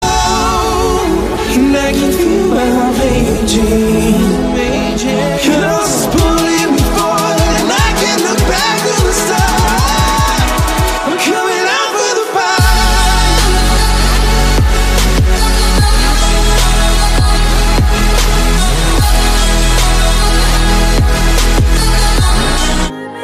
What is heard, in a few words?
beat